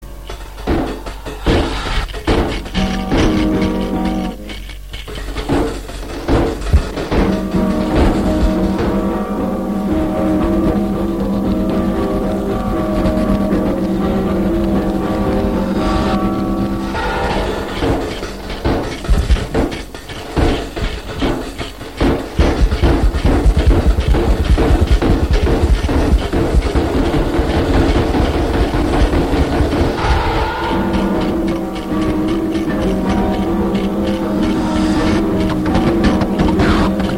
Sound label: instrumental; thrash avantgarde